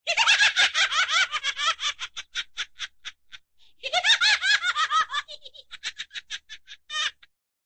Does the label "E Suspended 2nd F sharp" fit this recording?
No